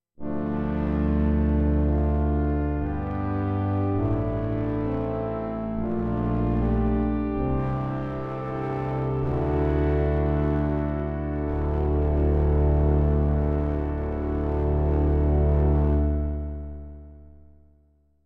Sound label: pad warm